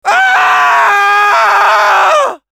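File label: pain critical death, French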